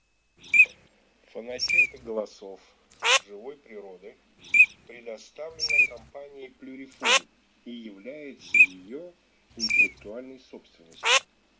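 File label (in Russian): Чирок-свистунок Alzavola, манок; plurifon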